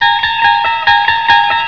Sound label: remote bomb; Dynamite